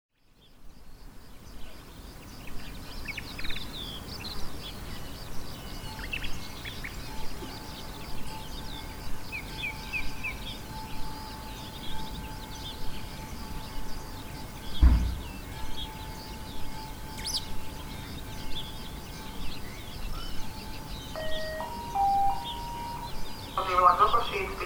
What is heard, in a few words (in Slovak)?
Čečejovce železničná stanica